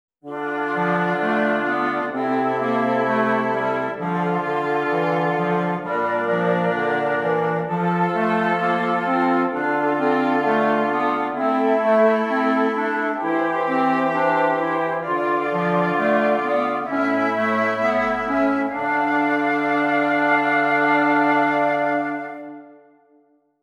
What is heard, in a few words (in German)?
Stimmiger Contrapunkt; Ronge